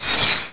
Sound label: Ice Range Bullet